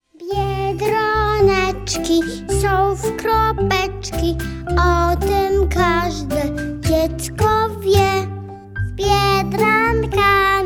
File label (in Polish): wersja wokalna